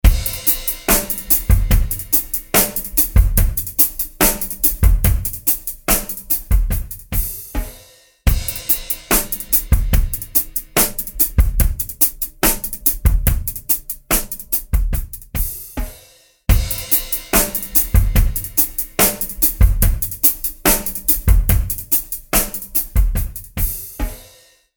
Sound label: Drums Carpeted Room